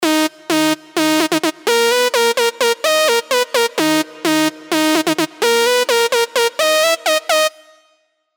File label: LD Pump